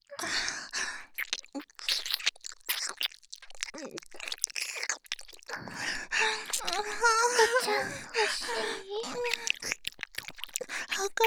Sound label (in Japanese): 耳舐め 喘ぎ, バイノーラル; 赤ちゃん作ろ♪; ハーレムオナサポ 4人のメイドがご主人様のオナニーをお手伝いするバイノーラル～文、千曜、名月、莉桜～